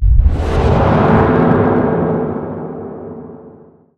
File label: mortal strike cast; weapons; dota; skeleton king